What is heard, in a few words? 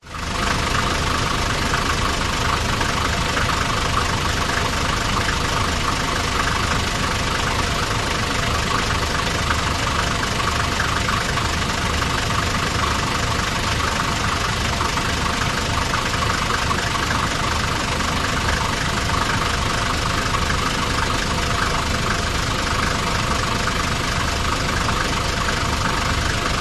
vehicles truck engine idle close